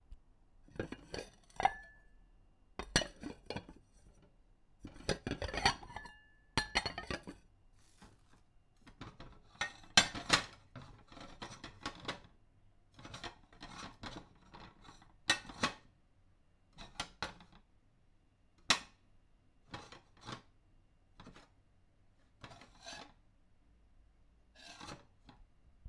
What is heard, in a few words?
Plates